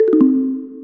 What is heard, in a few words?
User Leave